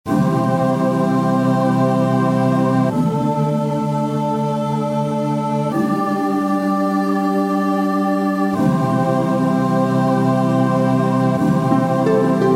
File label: Pharoanic song